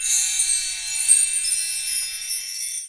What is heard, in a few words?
BELL TREE; 3D Sound; 3D Keys VOL-1